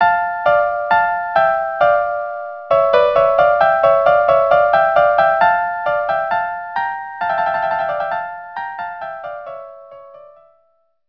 minuets violin duo; fireworks

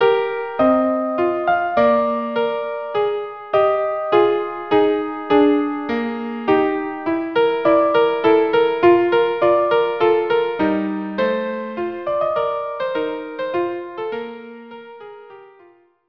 violin duo

